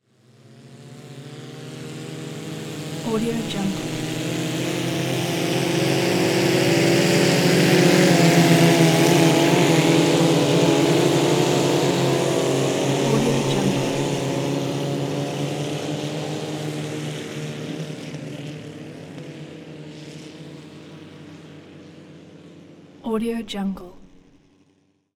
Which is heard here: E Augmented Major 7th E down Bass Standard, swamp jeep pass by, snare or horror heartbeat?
swamp jeep pass by